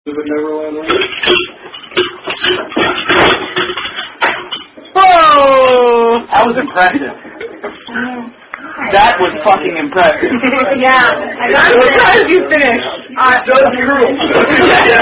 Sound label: Car Bomb Chug